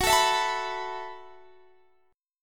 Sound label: B 7th Suspended 4th F sharp